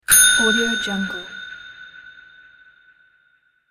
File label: bell ding